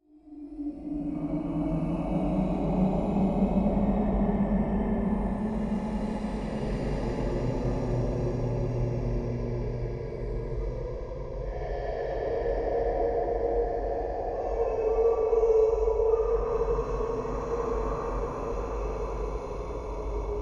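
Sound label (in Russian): человеческое дыхание с эффектом реверберации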